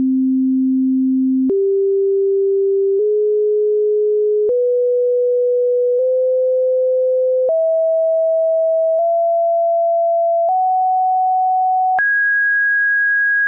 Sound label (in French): gamme a do a 9 tons planetaires